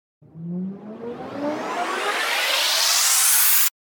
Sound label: RISER